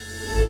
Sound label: big wins animation begin; PushGaming; Peter And The Lost Boys; peter Pan